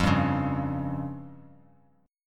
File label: F Suspended 2nd Sharp 5th F down